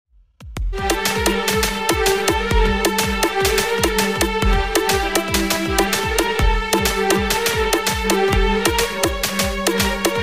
cartoon ringtone walkband instrumental music piano; shiva